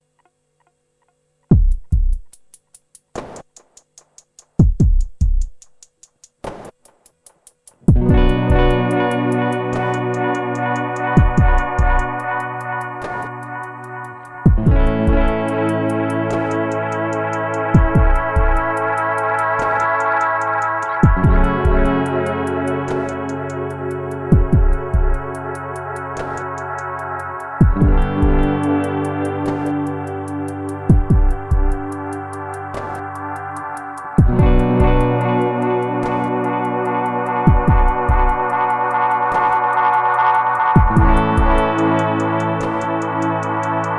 SOMA Pulsar 23 mit Gitarre Modulation von LFO und Feedback

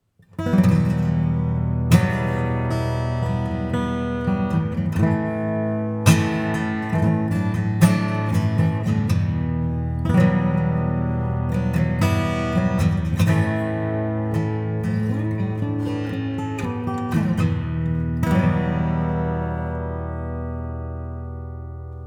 Akustikgitarre Preset Musik Hall Plate; Tascam Portacapture X6

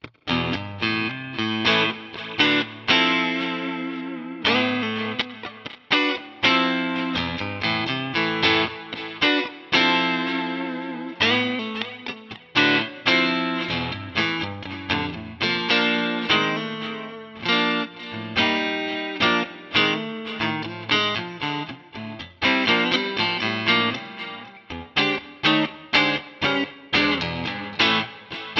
DI Aufnahme ohne Boss CS 3 vor Plug in

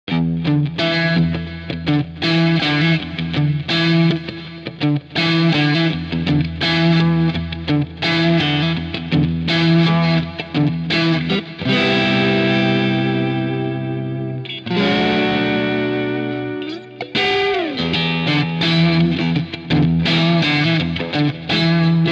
DI Aufnahme EQ 6 kHz an Plug in

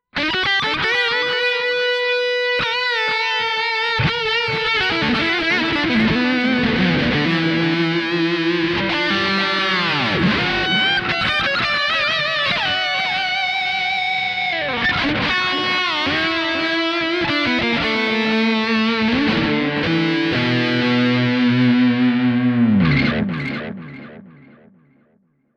EQD Silos Delay Analog Kontext Lead

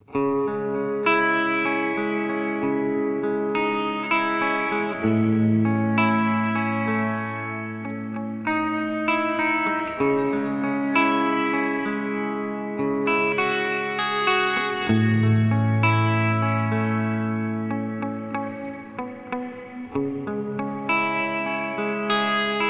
Hall; Meris Mercury X

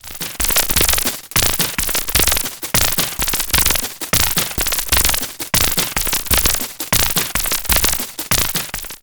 FM und Aliasing Beat

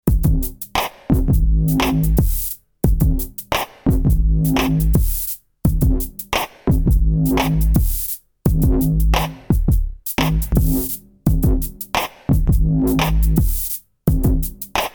Der Looper; Workshop Soma Pulsar 23 Patch

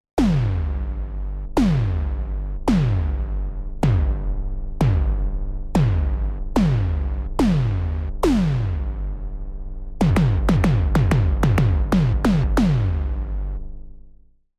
Bassdrum Simmons; Kobol

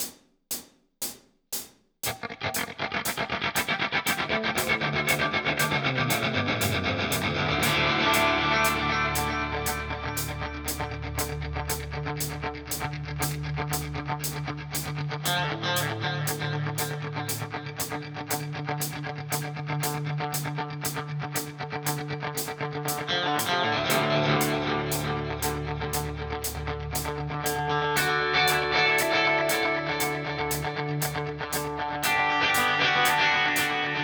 Coole Rhythmen mit Delay 118 BPM Dotted Eight; Hell Run

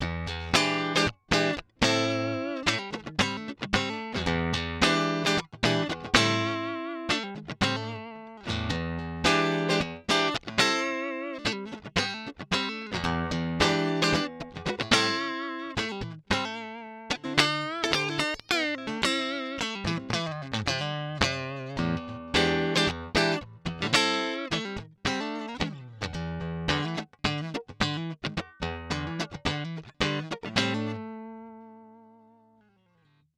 DI Aufnahme Treble Booster an DI Signal

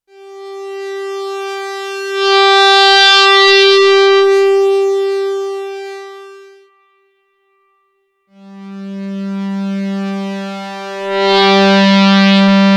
Digitale Saettigung Osc Volume wird von Hand hochgedreht

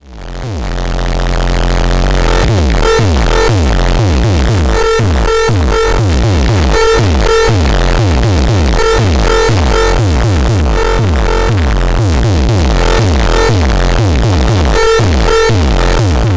Noise Industrial Dinogezwitscher Meccanico; Syntrx II; Sechs Experimente Teil 1

